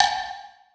wood block hi; Percussion; Sonatina Symphonic Orchestra

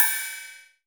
RIDE

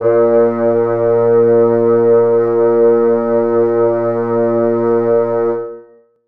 bassoons sus a#2; Sonatina Symphonic Orchestra

